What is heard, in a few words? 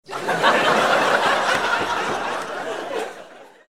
laugh